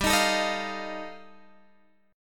G 7th Suspended 2nd Sharp 5th G chord, Guitar DADGAD